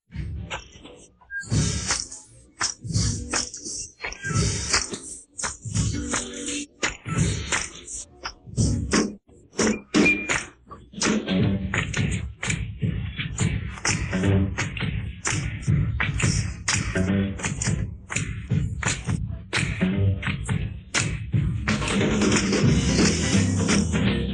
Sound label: instrumental